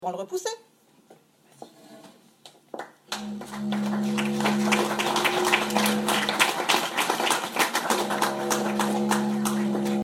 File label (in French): Didjeridoo; Cerny